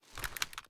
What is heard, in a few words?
reload empty fast raise; reloads; gmod; iw8